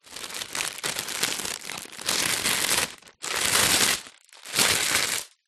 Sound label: foley foil birthday banner handling movements; zapsplat